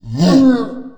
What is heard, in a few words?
grunts; finalmother